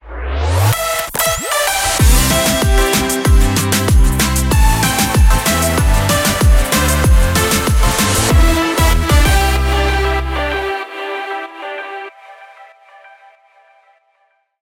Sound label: JINGLE; RADIO CHABLAIS; LIGHT